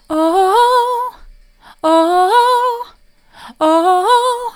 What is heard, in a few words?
oh oh oh soft, vocal